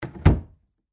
Door Close Hollow, Foley, Door, Close, Hollow, Wood, Basement; BlastWave